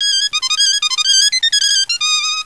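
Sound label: PICCOLO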